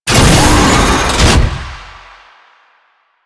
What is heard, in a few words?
Tank Turn Full, Movement; Warframe; Lasrian Tank